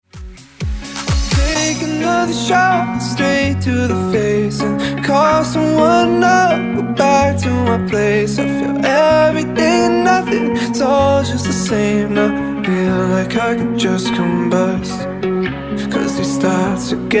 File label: Dance Redrum